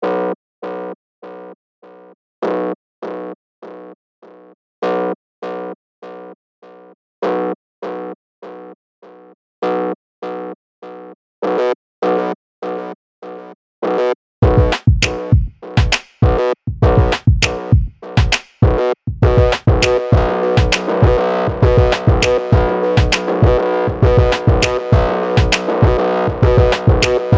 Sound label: Chrome Pipes